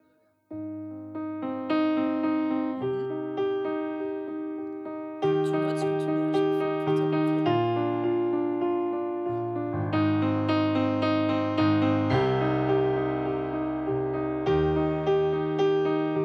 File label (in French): Duo Piano Voix